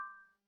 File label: click